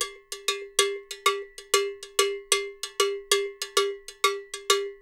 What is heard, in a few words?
Percussionworks